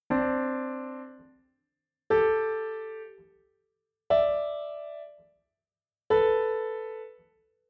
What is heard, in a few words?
harmonic tone examples